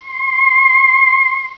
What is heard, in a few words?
magic